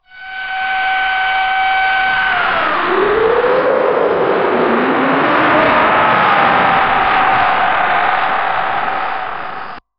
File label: transportation